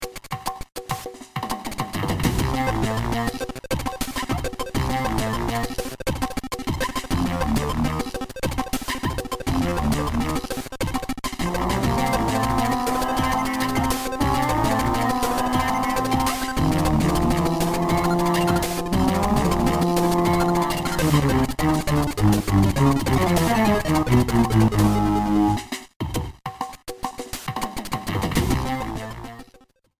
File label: Mini-Game Theme; MPA